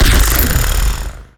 timber chain; weapons; dota; shredder

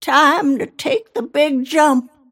death; snapfire

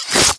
melee; physics

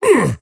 attack effort small; dota; axe

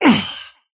parry fail; dota; kez

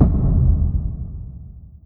fur army end; dota; monkey king

